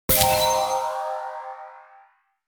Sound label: Level up game